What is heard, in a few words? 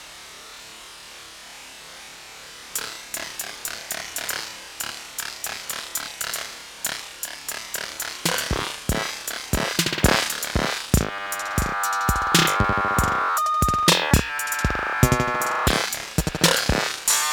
808 feedback triger Arpegio